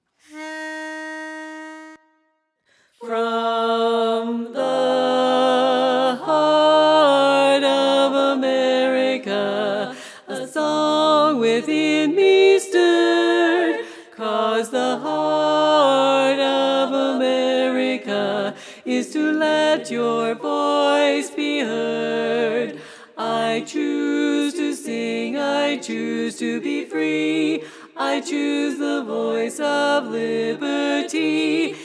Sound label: Regional Song Bari